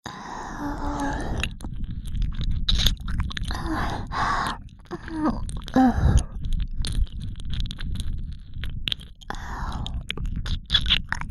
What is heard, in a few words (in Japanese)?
右耳舐めループ 耳塞ぎ; 耳塞ぎ舐めver